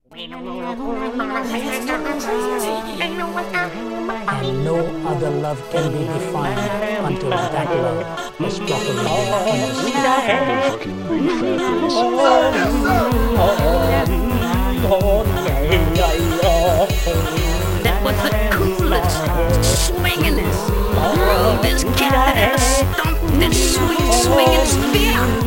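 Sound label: Big Fat Bass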